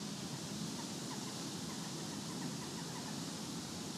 Ambi Loop, outdoor samples Ambience Loops

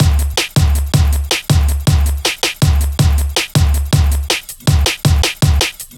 Swing, drum loop, jungle, 160.5; Zero-G Jungle Warfare 1, 2 & 3

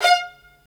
STR, Roland String Master Series, Vls Marc

